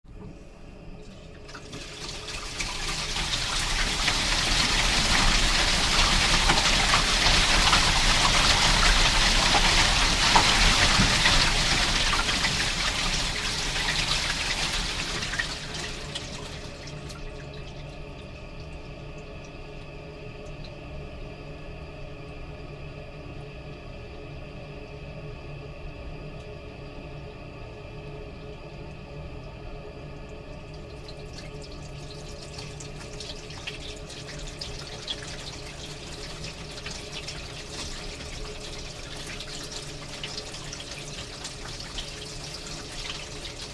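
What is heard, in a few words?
Toilet Flush Upstairs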